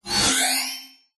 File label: science fiction robot mechanical burst transform; zapsplat